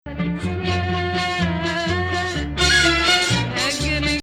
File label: Musta3ar